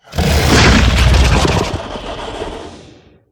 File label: Sniff, Behemoth; Evolve